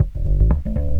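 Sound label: bass riff; INSTR LOP; E-Lab; X Static Goldmine 2; akai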